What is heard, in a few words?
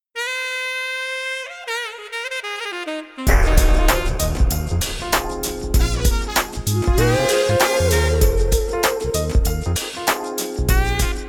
Theme Music Sax Bit; Kurumbu (2003)